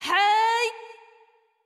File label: Charge Wind Up; Jackie